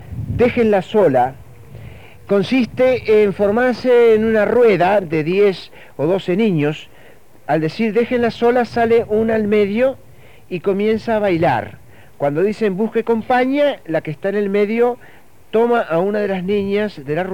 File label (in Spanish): juego infantil; Lauro Ayestarán